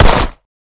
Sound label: fire, glock; Urban-Coalition; Decay; arccw ud